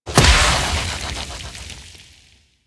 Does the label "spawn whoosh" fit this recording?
Yes